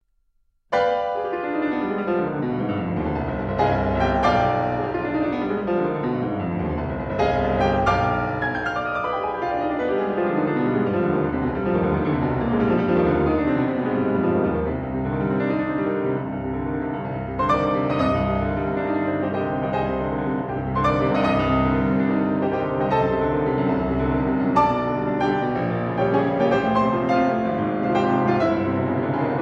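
Etude op 10 No 12 in C Minor Allegro con fuoco, Piano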